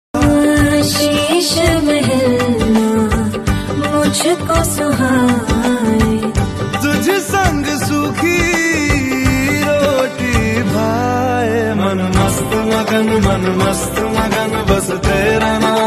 love ringtone

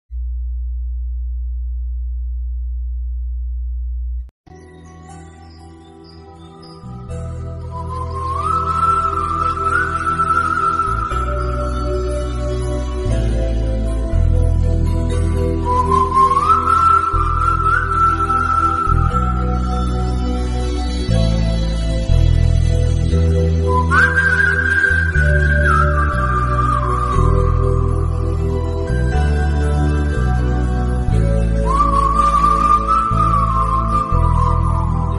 flute ring tone bgm ring tone; vaaranam aayiram

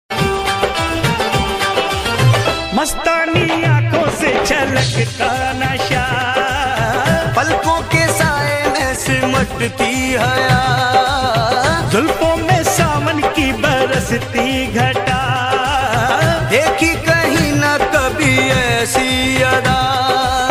hindi ringtone

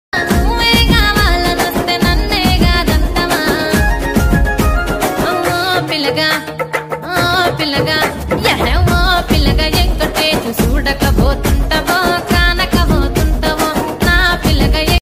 dance music

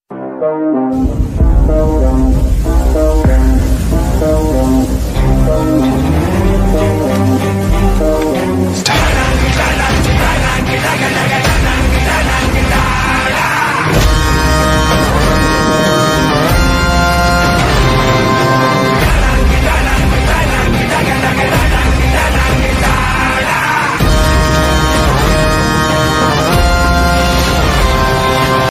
michael new bgm ringtone

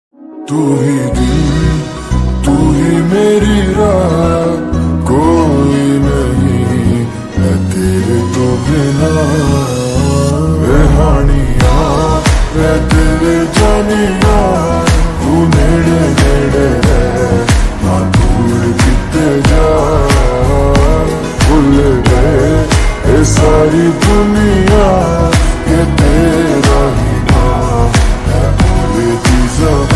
ringtone slowed lofi song

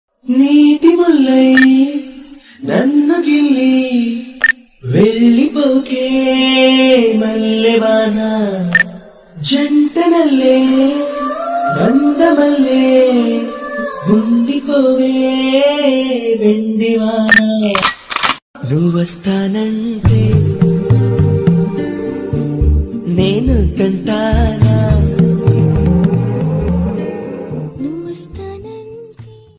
love bgm ringtone; varsham movie; prabhas

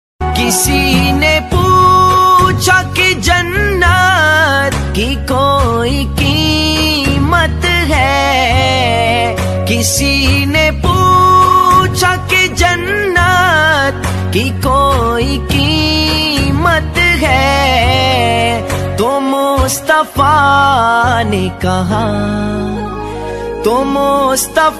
naat sharif ringtones; la ilaha illallah muhammadur rasulullah

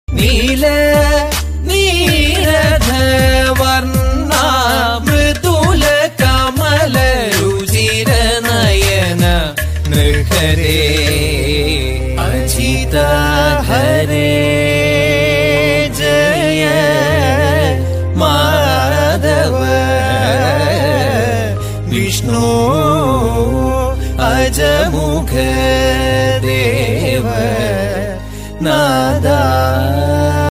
kathakali

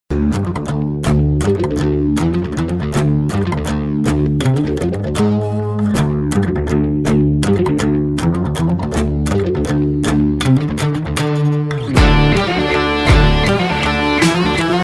mass bgm ringtone; superstar

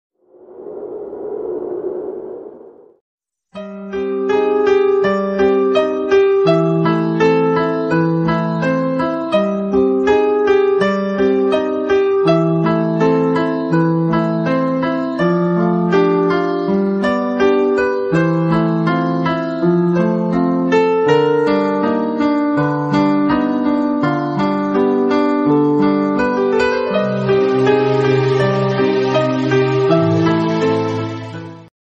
mouna ragam bgm instrumental ringtone

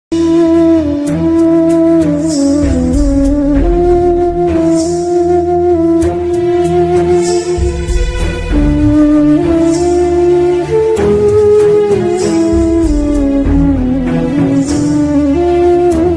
sad ringtone flute ringtone bgm ringtone

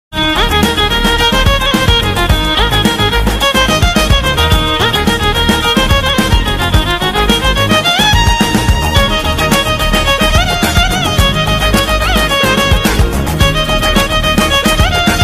bgm

